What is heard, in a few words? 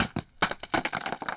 Falling down